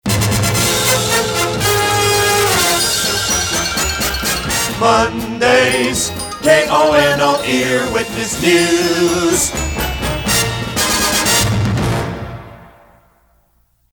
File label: Monday's News; KONO; PAMS